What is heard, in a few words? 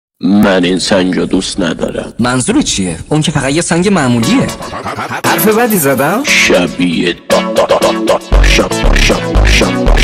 funk sped up